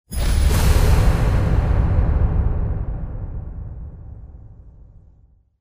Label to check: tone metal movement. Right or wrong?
Right